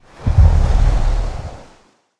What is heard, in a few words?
Water Elemental Aggro